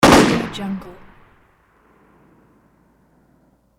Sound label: gun fire single outside heavy